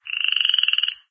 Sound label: small frog, frog groups; ambient; random animals; Left 4 Dead 2; Source Engine